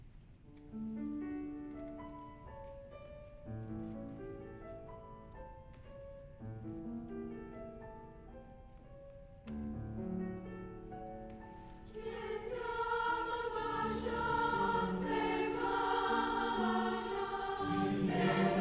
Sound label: University Choir Tonada